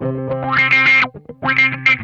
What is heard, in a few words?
GRAINY WAH, Funk Guitar; Zero G - Funk Guitar